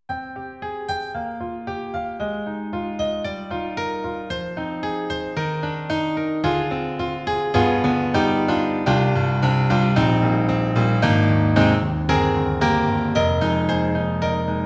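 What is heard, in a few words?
EDIROL SD-90, Solo, Stereo Piano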